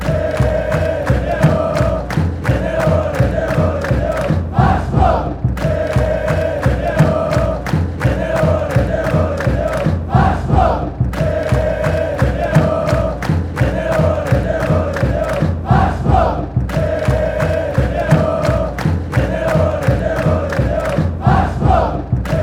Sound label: he leleoh leleoh, fanchants; vasco